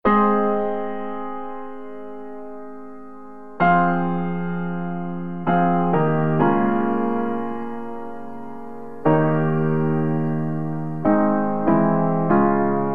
(Ab) No Backing Vocals

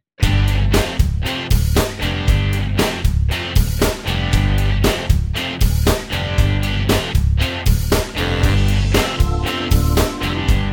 (G) No Backing Vocals

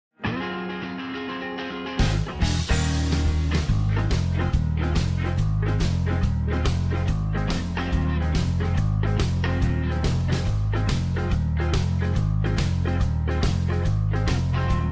Ab No Backing Vocals

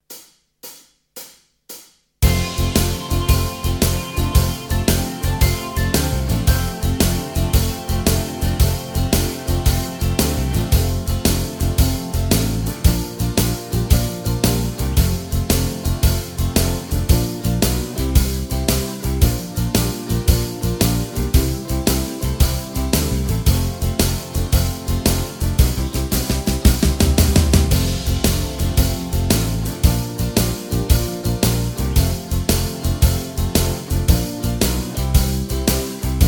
(F) No Lead Guitar

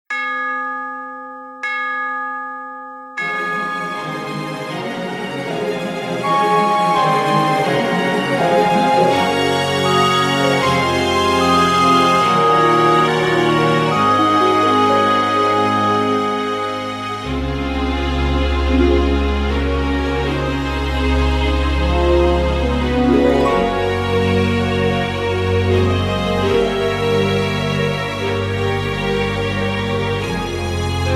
Db No Backing Vocals

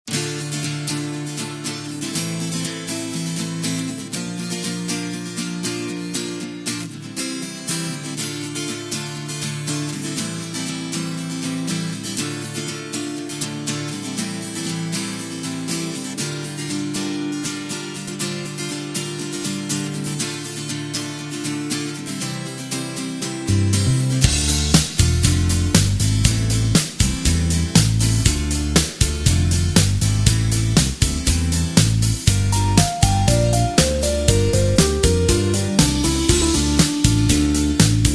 (Dm) no backing vocals

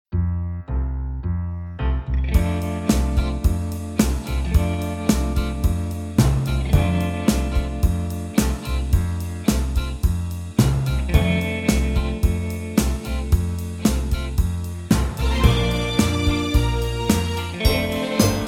(F) No Backing Vocals

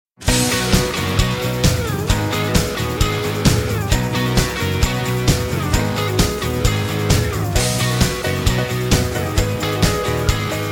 (B) No Backing Vocals

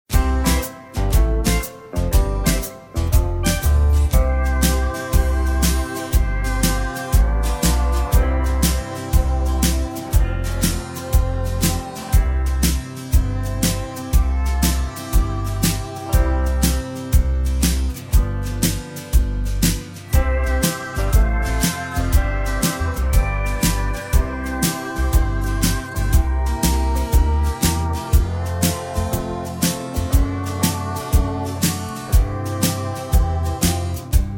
(C To D) No Backing Vocals

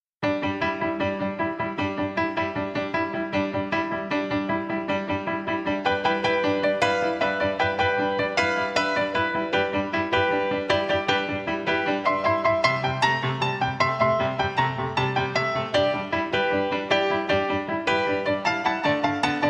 No Backing Vocals

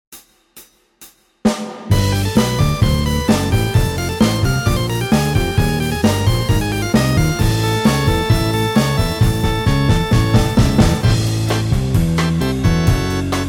(A) No Lead Guitar

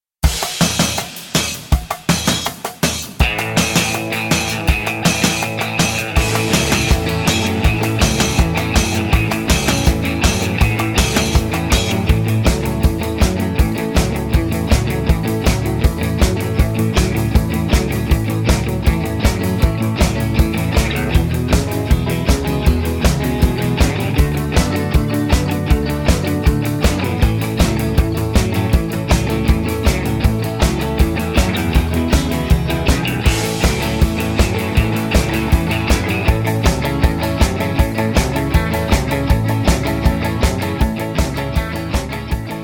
A No Backing Vocals

